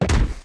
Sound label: Zombie Land; Hollow Knight (gamerip) (2018)